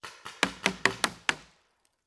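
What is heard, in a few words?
Paintball Gun Impact; Sound Ideas; Guns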